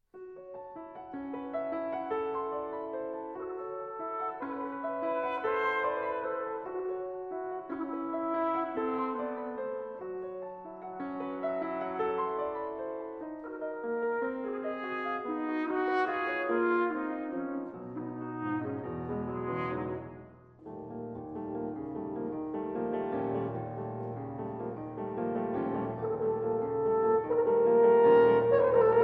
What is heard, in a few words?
Sonata for Horn and Piano, Allegretto